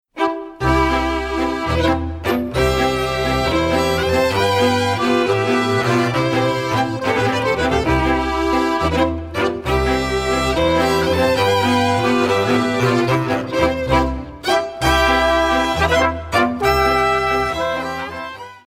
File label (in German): Walzer